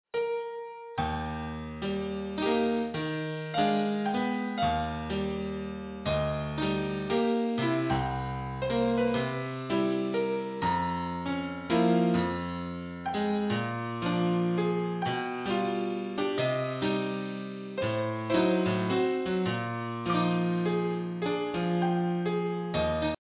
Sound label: synth